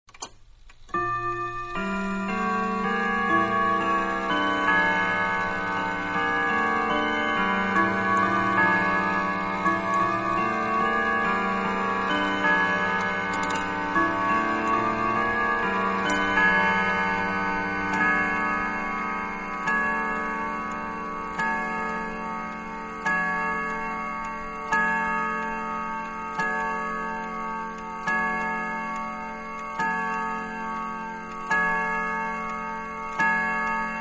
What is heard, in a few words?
Clock Grandfather-2Nd Tune; Machines; Clocks; Manhattan Production Music